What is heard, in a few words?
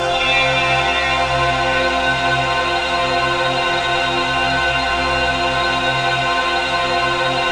ATMOPAD; Best Service; XX Large; Pads